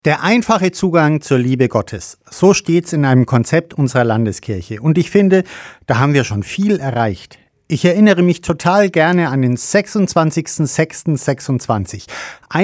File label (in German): gong